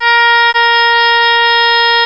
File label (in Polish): klakson